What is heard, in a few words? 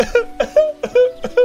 pathetic cry; eugene